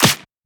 Shifted Clap; FLPRO

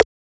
Transient; FLPRO

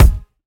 Kick

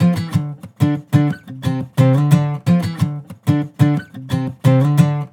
Acoustic Melody Loop - 180 BPM D Min; Cymatics

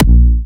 Harder A#4, Big Room Kicks

